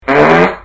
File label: fart